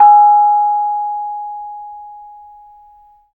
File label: BONANG AB5, GAMELAN; Spectrasonics; Heart of Asia; GAMELAN SET